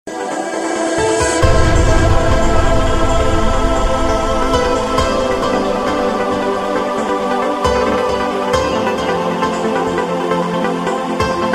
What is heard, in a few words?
nice rhythm